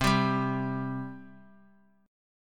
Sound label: C 5th C down Guitar; C5 strummed